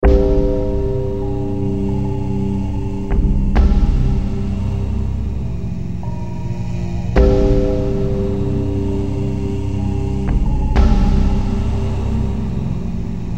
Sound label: instrumental